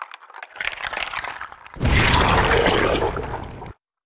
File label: big fly stomache problems final and explode